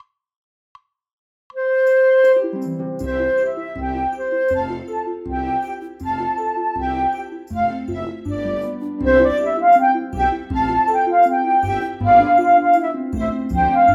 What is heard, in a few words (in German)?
Begleitung